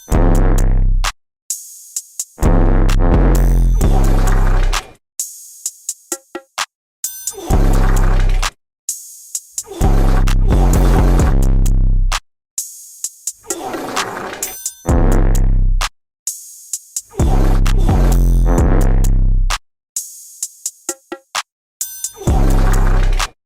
FART TYPE BEAT

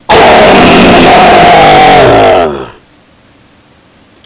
jumpscare sound; FNAF

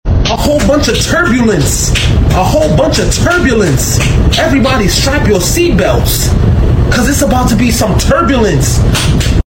a whole bunch of turbulence; memes

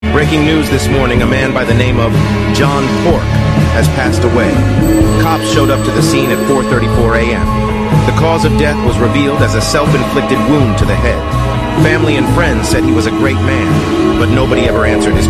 SAD STORY; memes

